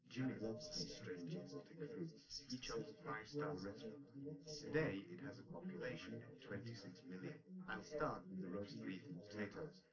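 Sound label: male, live recording 250ms 1m; SiSEC11; underdetermined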